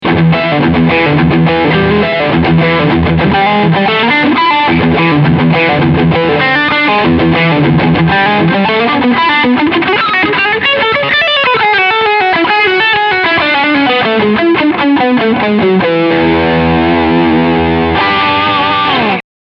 Brit Full Gain

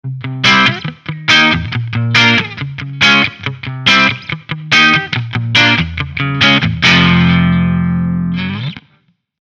Jackson DK2 Neck clean

